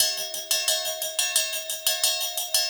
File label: Cymbol Particle; Particles